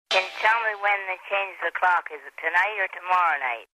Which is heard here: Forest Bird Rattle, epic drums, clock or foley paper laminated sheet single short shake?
clock